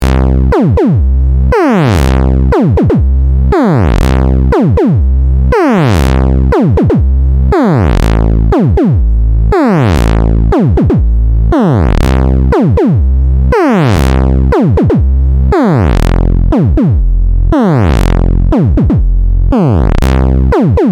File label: SLIDE TECHNO